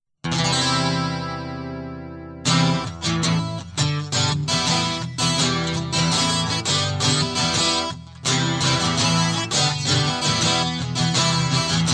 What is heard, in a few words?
Guitar